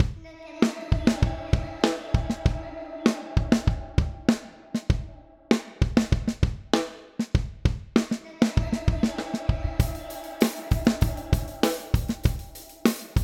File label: DRUM